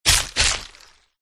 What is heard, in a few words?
cat attack contact middle